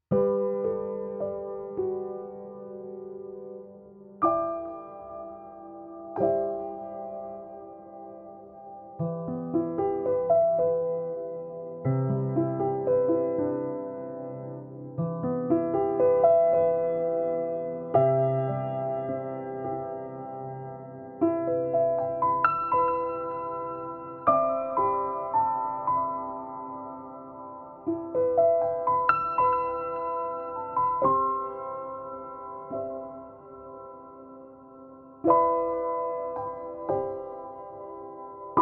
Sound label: Una Corda; Pt6